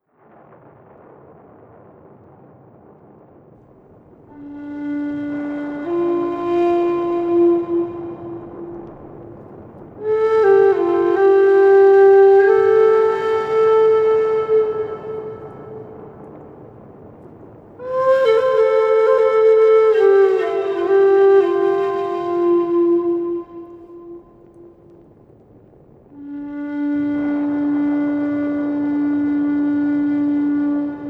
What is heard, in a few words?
Sound As It Leaves the Flute, Native American Flute